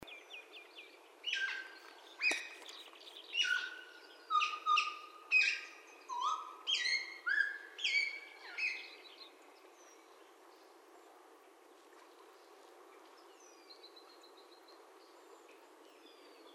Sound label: black parrot